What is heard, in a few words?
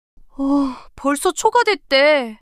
surprise